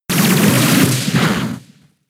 Water Gun; Pokemon; gen 6 attack moves; XY ORAS

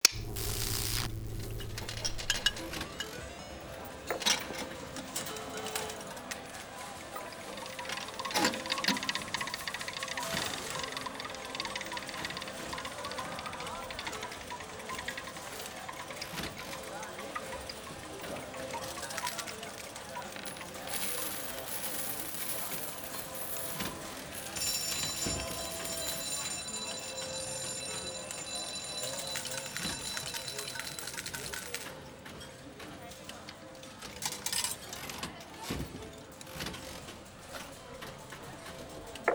Fx Amb Lobby

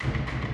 Music Fight Vikings Theme Average 112bpm Start; asterix obelix slap them all 2

